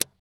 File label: TAP, Drums; Distored Reality 1&2